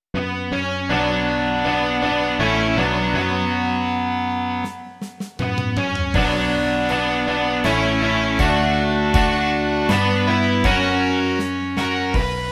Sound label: rock